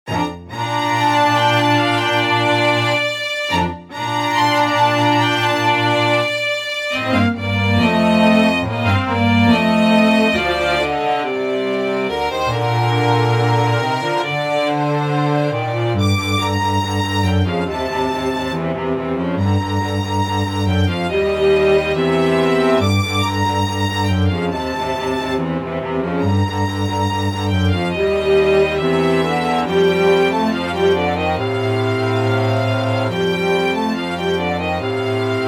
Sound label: string trio; mvt 1